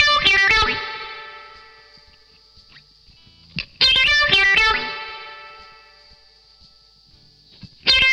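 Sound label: DISCO guitar LEAD, 118 BPM, D; Drumdrops; Compact Disco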